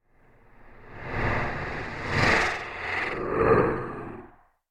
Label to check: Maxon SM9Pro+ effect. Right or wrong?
Wrong